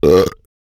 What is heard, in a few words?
burp; OPENAIR; Anechoic